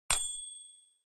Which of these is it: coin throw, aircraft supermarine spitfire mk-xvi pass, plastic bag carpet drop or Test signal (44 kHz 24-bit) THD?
coin throw